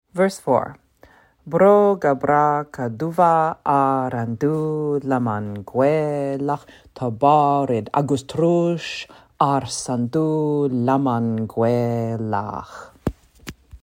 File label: Chant